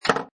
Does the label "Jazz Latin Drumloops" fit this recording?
No